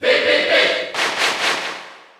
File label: Cheer Dutch; Pit; SSBU